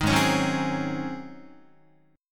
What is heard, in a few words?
G sharp 11th G sharp, down, Guitar; G#11; strummed